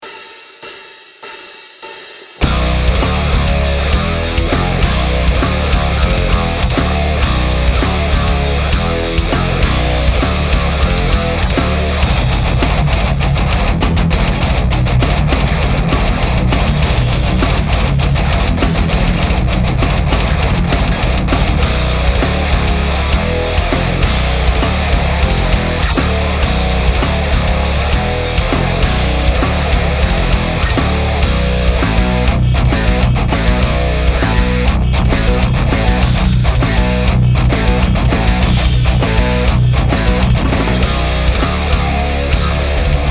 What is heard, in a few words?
KPA High Gain, Mastered; Kemper Profiling Amp